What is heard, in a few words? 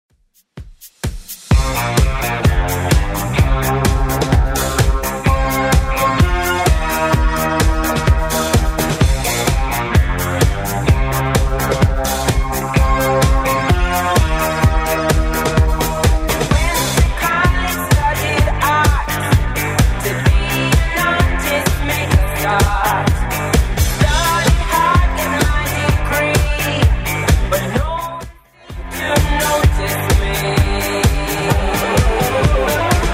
70s Redrum 128bpm